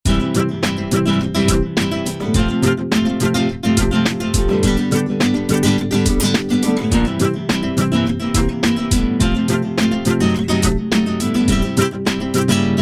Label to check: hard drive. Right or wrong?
Wrong